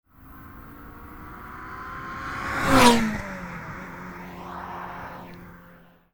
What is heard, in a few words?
ferrari 355 drive by